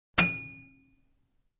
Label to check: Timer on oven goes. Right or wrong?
Right